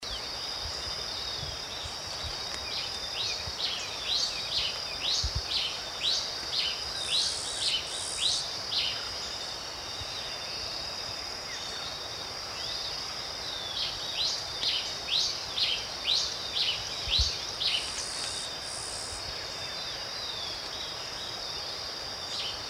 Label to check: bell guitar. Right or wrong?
Wrong